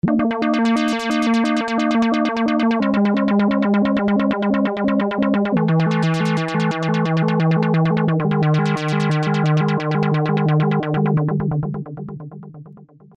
animated

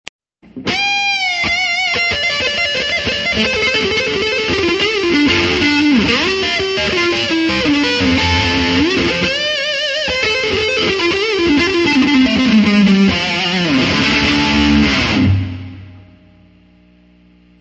Titan Lead W Verb

